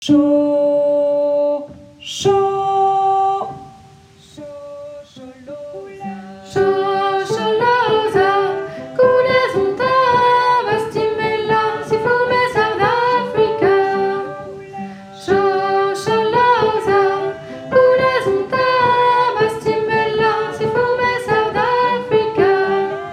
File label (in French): shosholoza voix séparées soprano